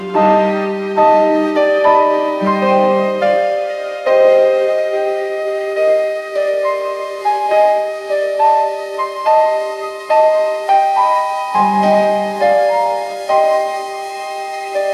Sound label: elegant cinematic strings and piano